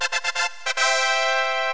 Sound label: big brass